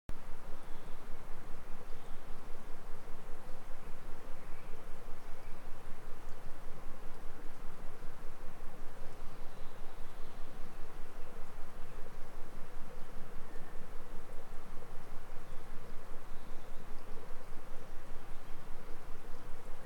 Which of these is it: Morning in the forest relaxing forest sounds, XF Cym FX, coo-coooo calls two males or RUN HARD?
Morning in the forest relaxing forest sounds